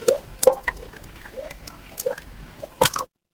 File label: water drip